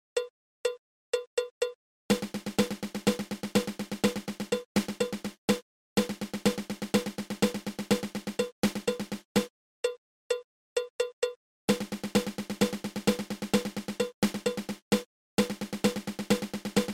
Single Paradiddle

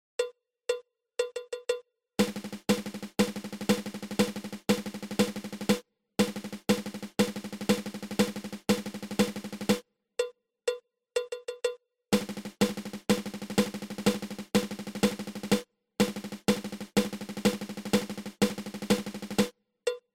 Double Paradiddle

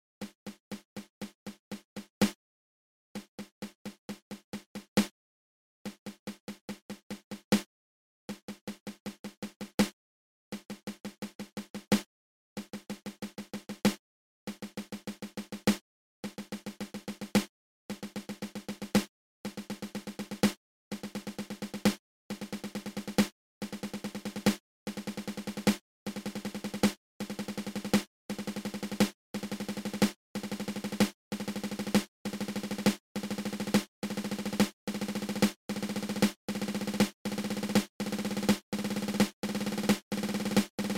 Nine Stroke Roll open close open

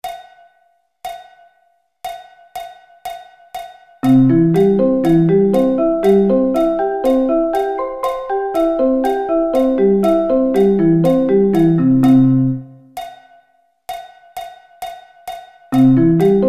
C Arpeggio Inversions

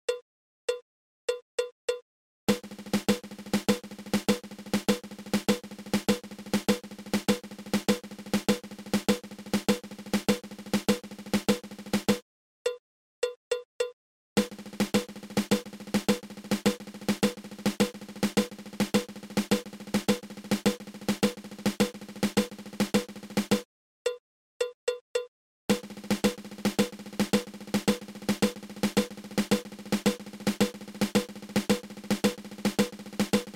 Six Stroke Roll

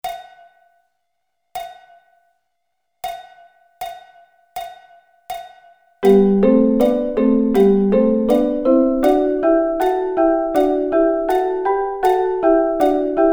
G Double Stops

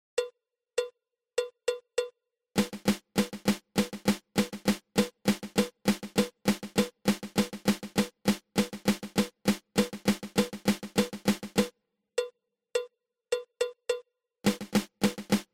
Inverted Flam Tap

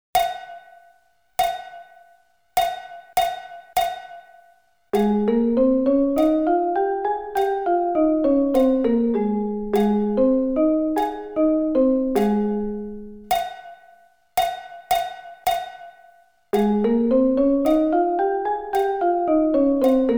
Ab Scale

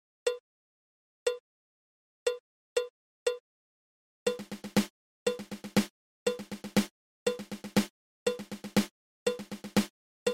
Five Stroke Roll

